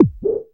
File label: NOISE BLOCK, synth perc; Ambient; Zero-G; Ambient 1